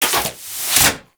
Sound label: Mods Sleep To Working; Warframe; Hub; Liset Machines; Tenno Mods